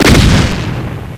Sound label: shotgun shoot; DOOM